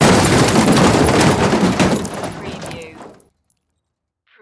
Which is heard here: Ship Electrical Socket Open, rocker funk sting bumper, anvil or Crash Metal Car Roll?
Crash Metal Car Roll